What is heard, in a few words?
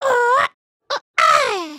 robot dance, emotes